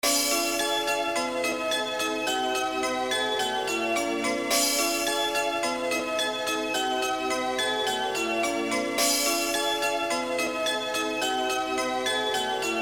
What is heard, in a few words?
hardcore vibes